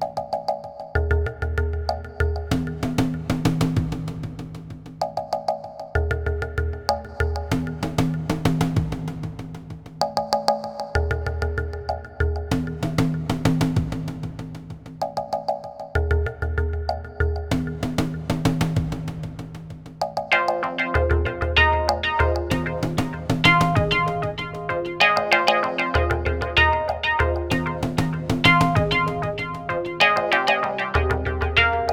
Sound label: ambient